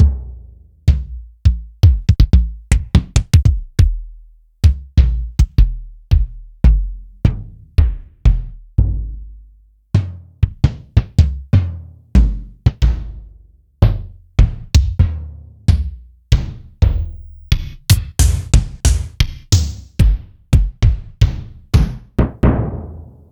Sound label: Yamaha DTXpress K - Acoustic Kick